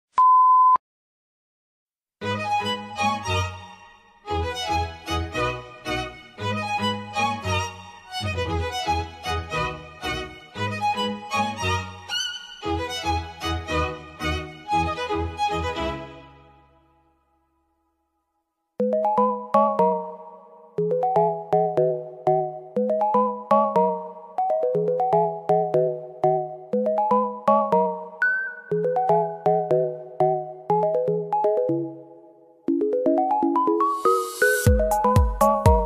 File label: floor music